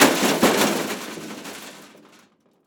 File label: Crash Metal With Debris; Crashes